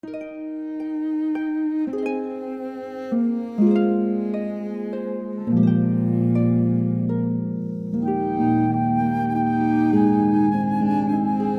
harp flute cello

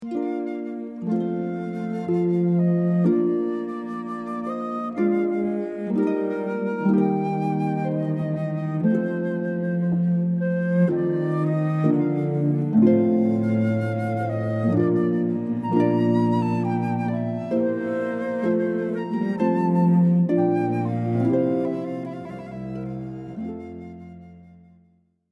Adagio, Handel ob. concerto, harp flute cello

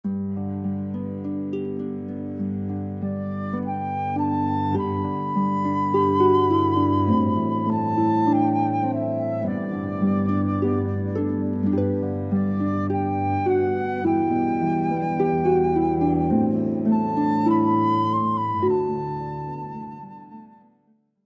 harp flute cello

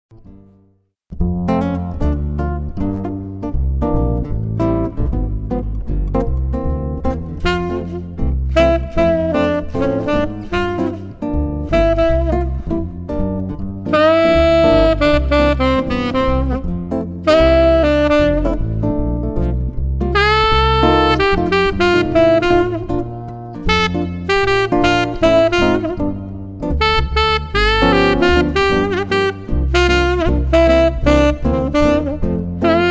Jazz Trio of Guitar; guitar base jazz; Lyrica Demo

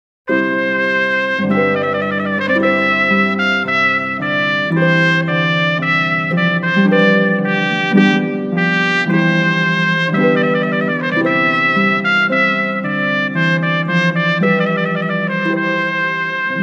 Trumpet Voluntary (with harp); trumpet piano organ harp; Lyrica Demo

